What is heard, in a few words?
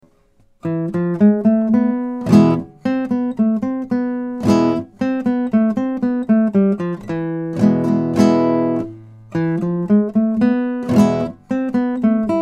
Guitar; Fingerspiele